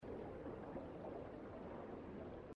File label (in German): schwimmen; inside